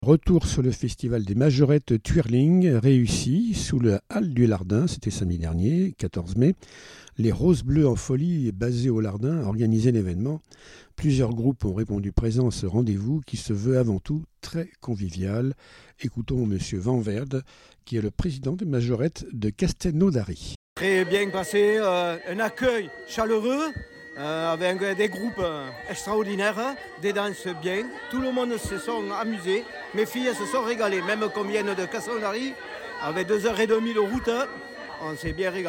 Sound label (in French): majorette; castelnaudary